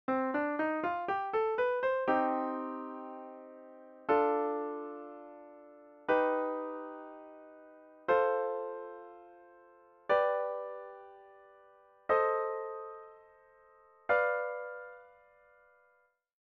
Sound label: melodic minor; shosys academy; conventions of chord labeling